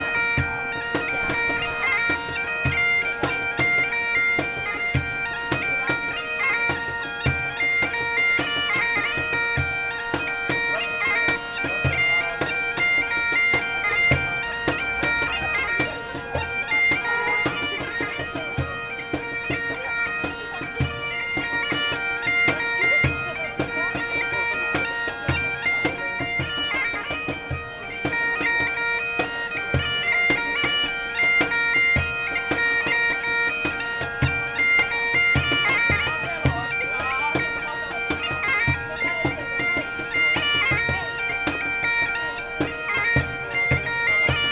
Edinburgh Backpipe and Cajon

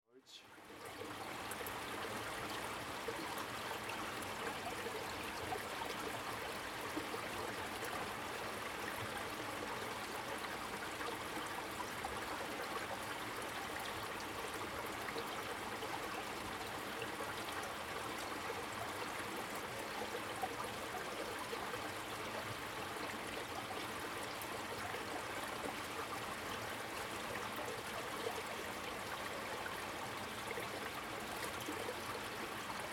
Bell Gorge

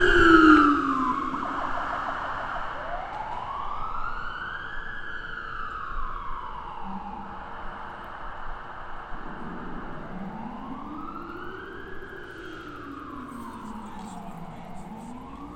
Financial District Police; XY

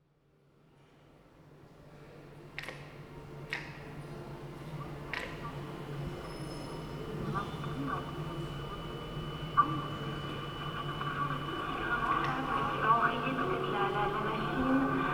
Basel Tinguely Museum

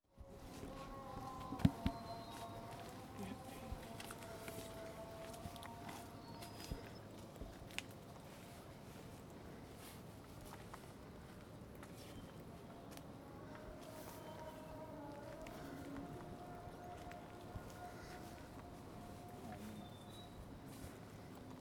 Muezzin Tanah Rata

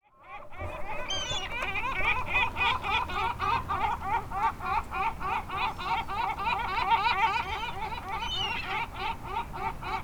Playa Balandra Seagulls fighting for fish; XY

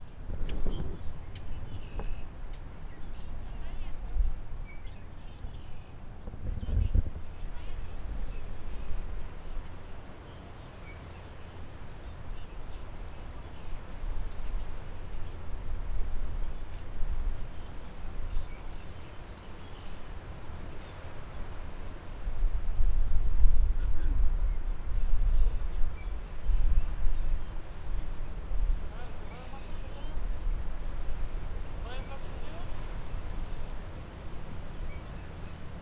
Lovers Point XY

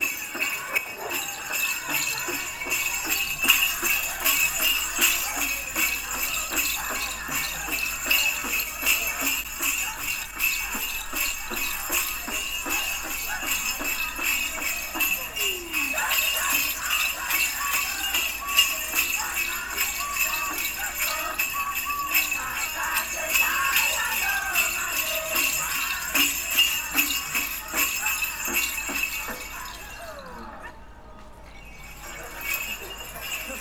Santa Fe Indian XY

